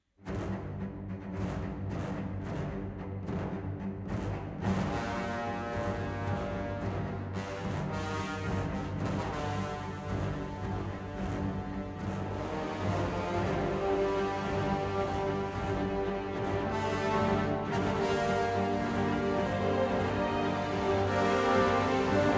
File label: War Declared No Choir; Crusader Kings III